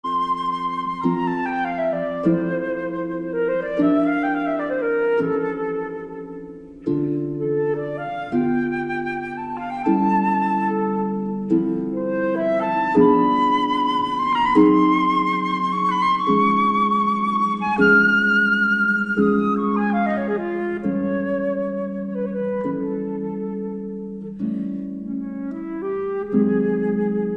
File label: harp, Flute and Harp in F; Morceau de Concours